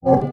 multimedia item removed; dusty room

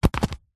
wallet leather set down on hard surface; foley; zapsplat

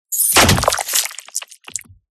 DESIGNED CINEMATIC BLADE STAB; PMSFX

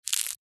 insect caterpillar bite eat leaf; animals

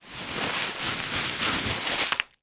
thin plastic bag movement

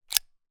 gun bersa; still north media

